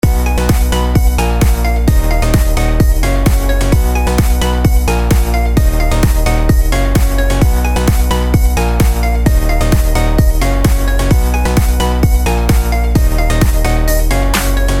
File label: phonk like